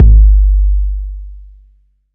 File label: ambient kick drum one shot g key